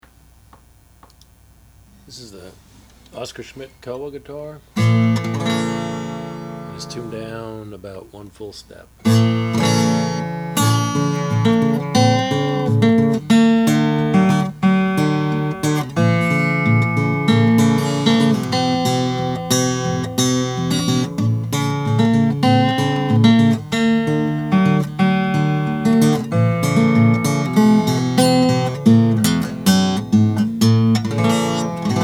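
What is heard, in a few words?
oscar schmidt stella hawaiian guitar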